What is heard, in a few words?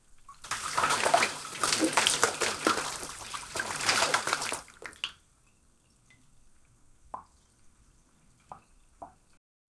water rains down on the; bottle